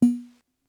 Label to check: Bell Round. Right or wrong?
Right